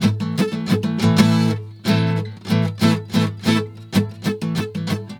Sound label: GUITAR, Balkanish Traditions